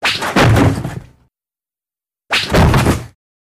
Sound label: Body Fall Swish